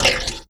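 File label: HH, hi-hat, drum kit; Sonic Foundry (Sony Creative Software); Esoterik Beatz; Percy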